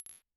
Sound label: Bottle Struck